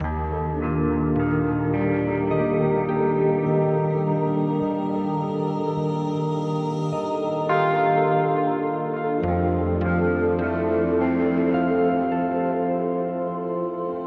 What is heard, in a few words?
Ocean Waves